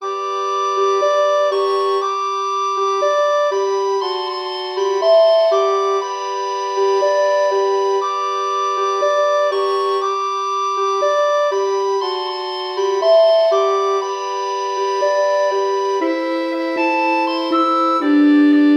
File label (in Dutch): blokfluitkwartet